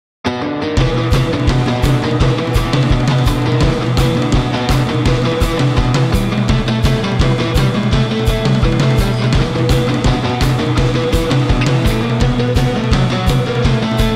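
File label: Pianoteq Guitar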